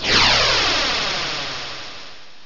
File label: Bounce; pokerogue; battle anims